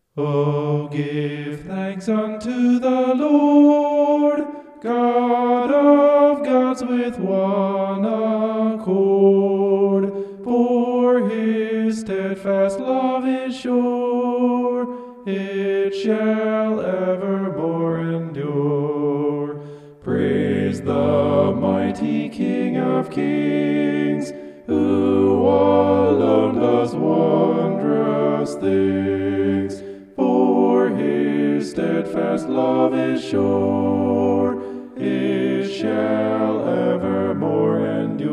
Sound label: vocal homoph; psalm 136